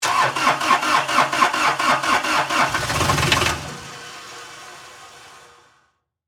Lawn Mower Stall, Toro, Reelmaster, 5200-D, Start, Golf, Course; Industry